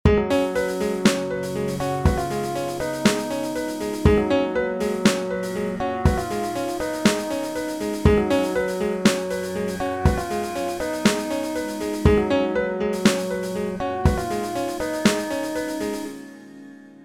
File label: Sofa jam